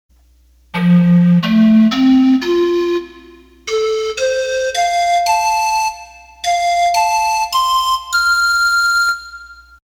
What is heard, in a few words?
Pan Flute